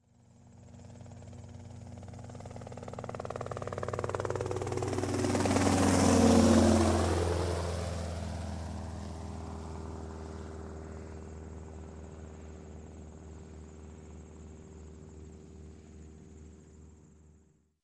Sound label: Helicopter Robinson pass By, Medium; Sound Ideas 2000 Series